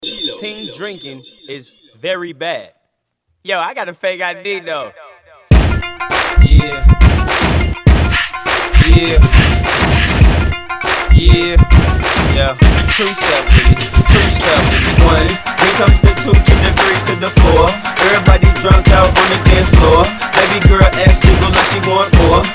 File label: Punjabi remix